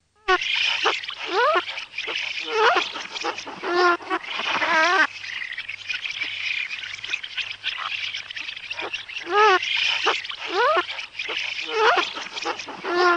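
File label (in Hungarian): Kanalasgém; Madarak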